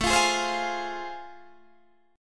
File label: A Minor Major 7th Sharp 5th, Guitar Open D